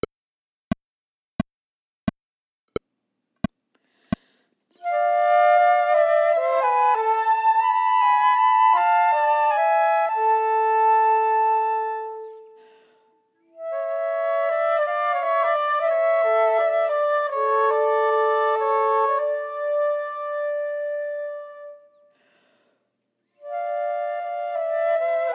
Chorale, Chamber Music for 3 Flutes minus 1st part; Bach